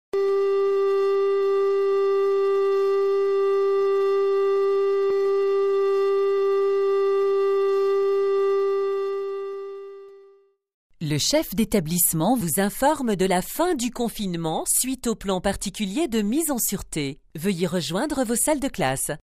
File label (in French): Sirene et voix annonce de fin de PPMS